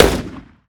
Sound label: makeshift rifle shot; casualtiesunknown